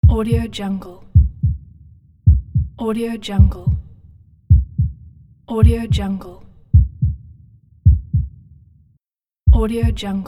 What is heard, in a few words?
heart pulse